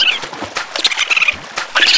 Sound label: Penguin Run; Seer; GamigoNA